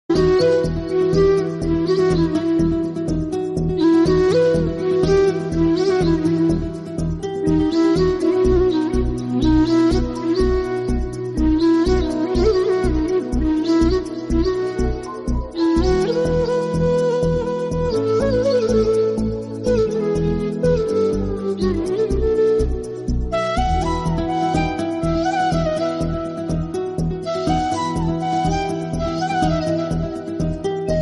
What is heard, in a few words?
song ringtone flute version love ringtone melody